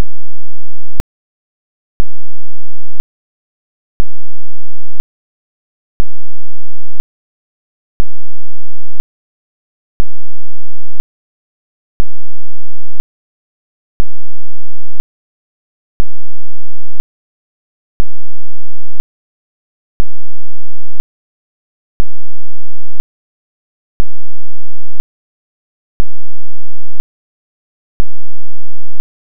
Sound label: Dc Off Set 100 Percent Clipped Square